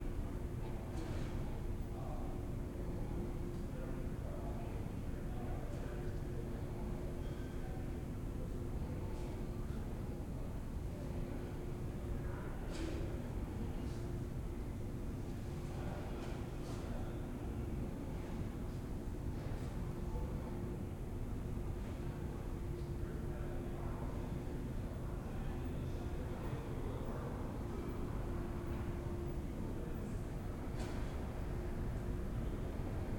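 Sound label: Nighttime Urban Side Street Empty Rain Drops Hum; Systematic Sound; General Ambience Series; Nightscapes 01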